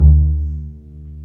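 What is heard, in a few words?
STR Cb Pizzicato, strings; Cb Pizz 1; Roland L-CD702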